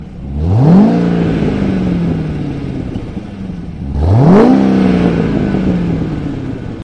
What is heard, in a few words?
Corvette Engine Purr